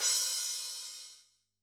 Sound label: Crash, drum